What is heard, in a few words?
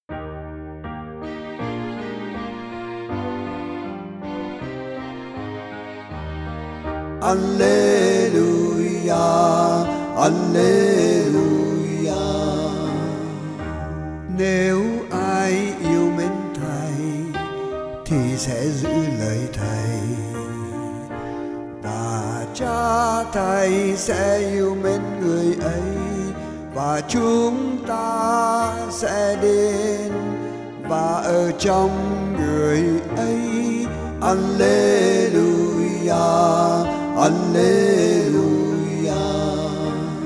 alleluia song